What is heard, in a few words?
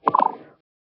fx bubble